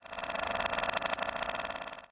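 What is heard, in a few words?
Windmill Creak Reverse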